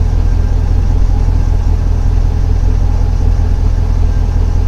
Muffler Idle Sounds

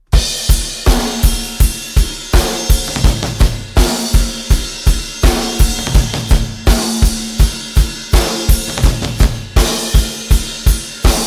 Intense Drums

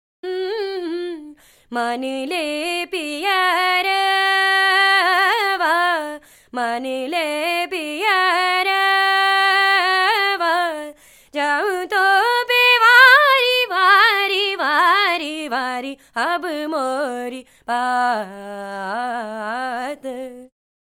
India Vocal

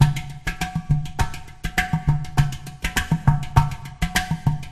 Jamaican Steel Drums

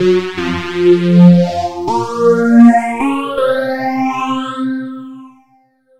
Phased Out Pad

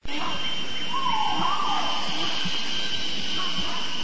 Marsh Sounds

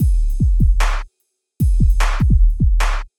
hip hop loop

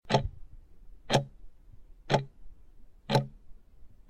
Clock Ticking

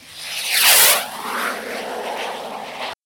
Jet Airplane

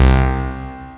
Crisp Distorted Bass

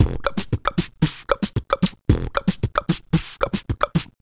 Beat Box Loop

